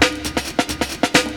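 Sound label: LOOP snare drum, Drum & Bass, 130 BPM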